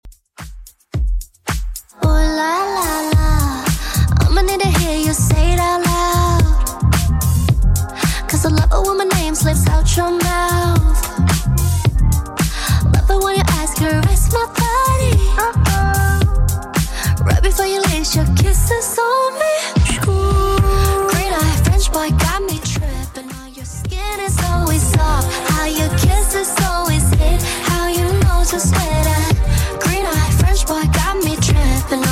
Top40 Redrum 110bpm

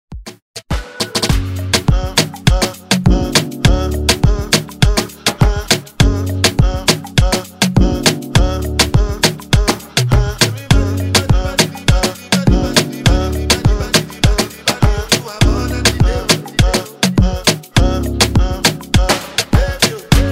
Moombah Afro Redrum

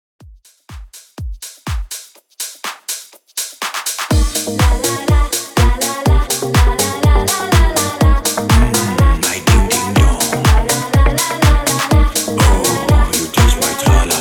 MASHUP 123 BPM

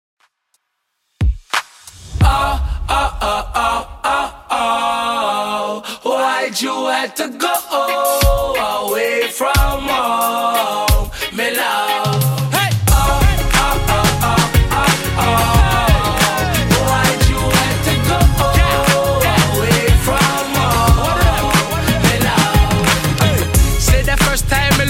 Rnb Redrum 90bpm

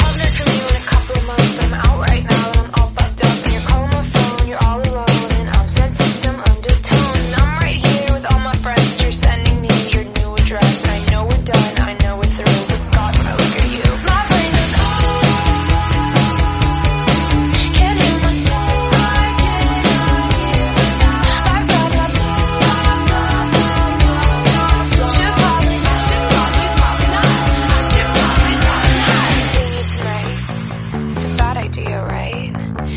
130bpm E

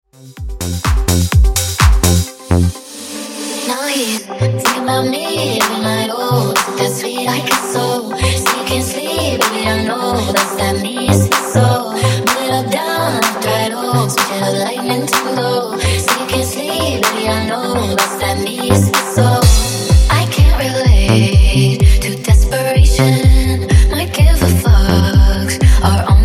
Dirty 126 Bpm

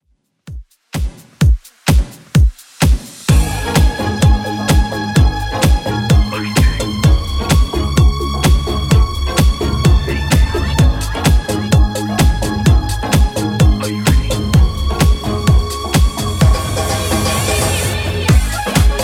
90s Redrum 128bpm

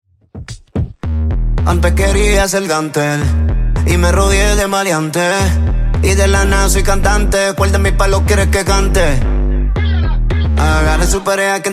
Top 40 Intro Outro 110bpm

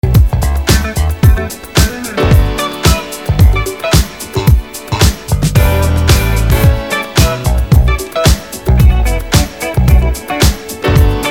80s Redrum

